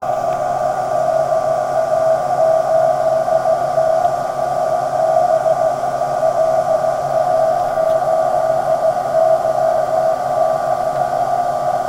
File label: EME CW